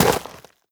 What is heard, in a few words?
step, footsteps player debris